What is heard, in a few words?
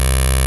HIGH VOLTAGE, poly 800; TECHNO-BWARE